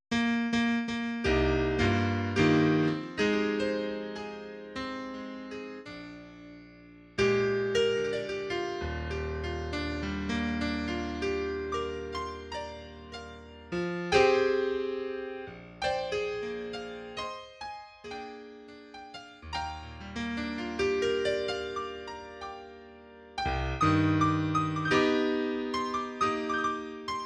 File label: piano